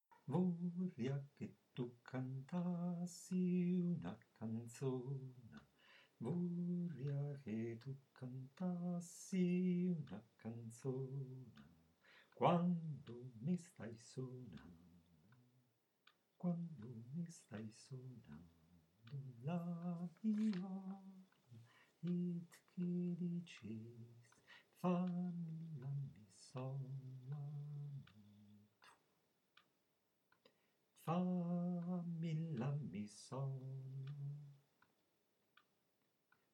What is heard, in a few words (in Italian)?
studio bass; vorria